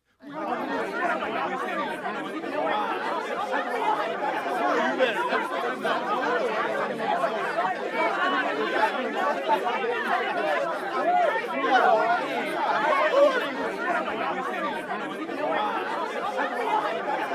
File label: old vocals